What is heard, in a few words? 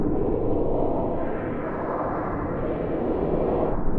Sample n Hold Verb Loops, sparse soundscape